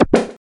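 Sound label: access denied; hill climb racing 2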